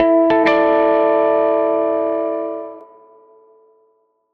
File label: SG Guitar Loop